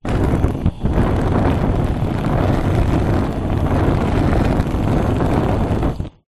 household cooker oven hob gas flame blow movement wind; zapsplat